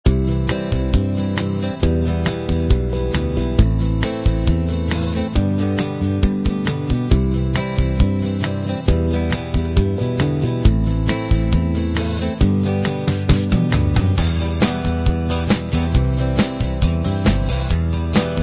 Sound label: light rock